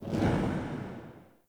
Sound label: Word Upgrade Menu Enter; UI; Word Upgrades